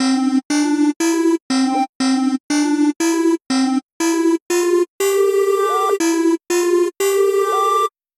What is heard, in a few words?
tune